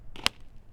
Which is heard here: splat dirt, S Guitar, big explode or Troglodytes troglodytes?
splat dirt